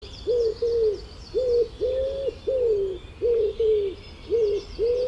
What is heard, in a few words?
Woodpigeon